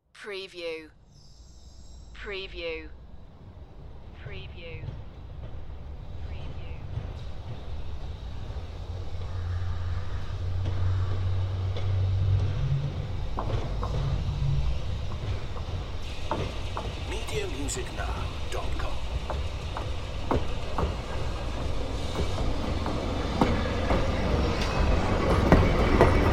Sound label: Trolley Pass By